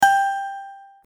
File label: ding